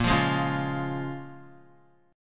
Bb Minor 7th, Guitar, Bbm7 chord; Bbm7 strummed

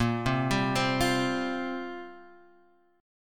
F Suspended 2nd Suspended 4th Bb

